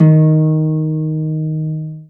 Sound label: STR HARP, STR Orchestral; Sound & Vision; Gigapack I CD 2